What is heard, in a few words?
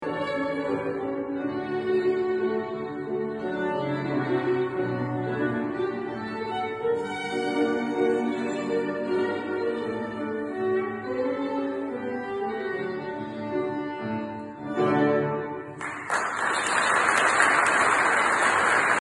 Kreisler Marsch